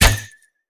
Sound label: archer shoot arrow